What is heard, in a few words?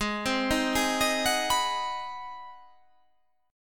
G sharp 7th Sharp 9th